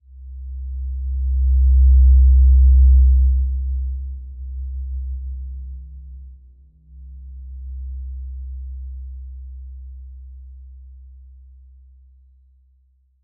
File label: Simple Glow C2 mf; HSS-Custom-1; healing soundscapes